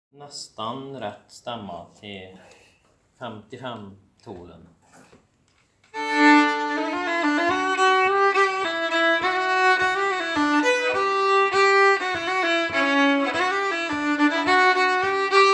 Polska, 2a stamma; Femtolen, Polska 2 efter Byss-Calle

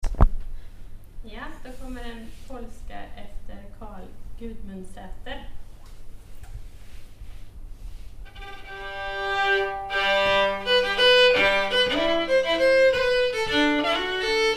Polska, Dalarna; Polska efter Karl Gudmundsater, Transtrand

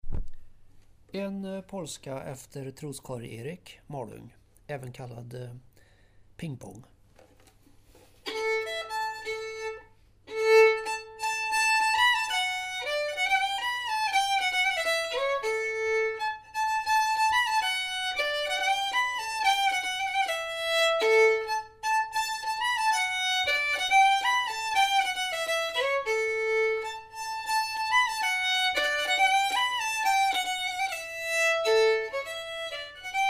Polska, Malung; Pingpong, Polska efter Troskari Erik

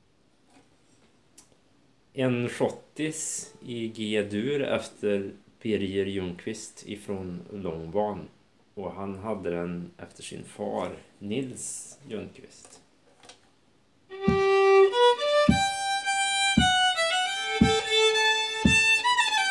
Schottis fran Langban; efter Birger Ljungqvist

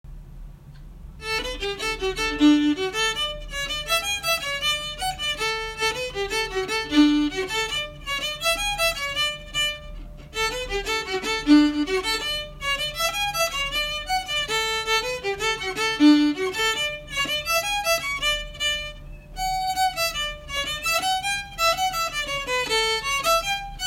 Mazurka; Mazurka efter Hakan i Kvarna, Varmlands nas

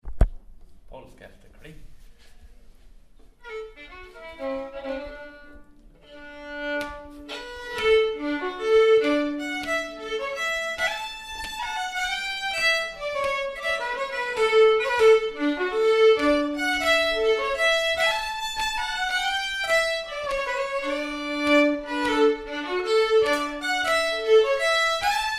Polska; Polska 2 efter Kling, Lekvattnet

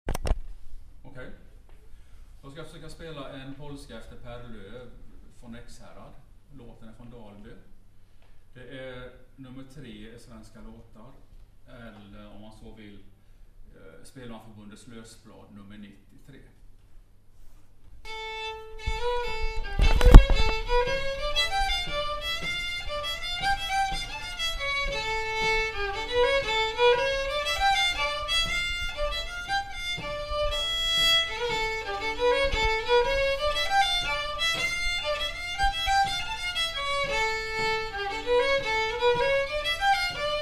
Polska; Polska efter Per Lof, Dalby, SvL 3

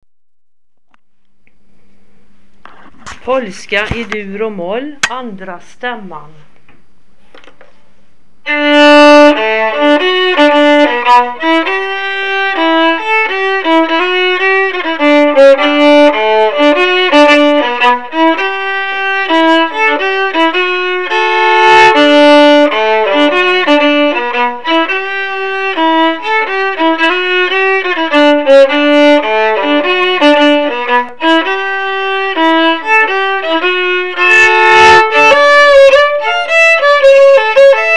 Polska i dur och moll, 2a-stamma

